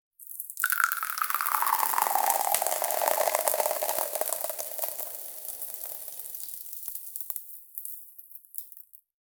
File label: powder pouring our of lar